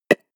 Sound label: pop